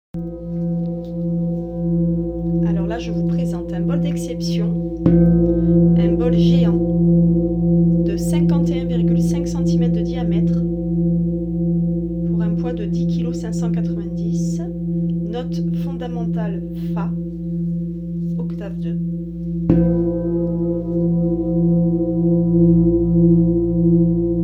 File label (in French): bol geant d ancrage, bol d ancrage geant et sa mailloche